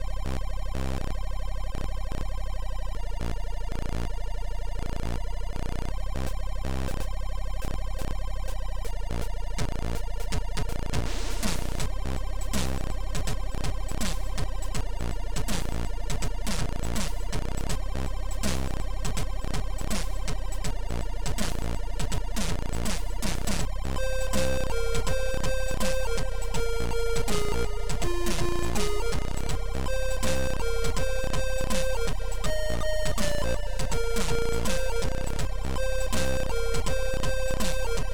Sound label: bleep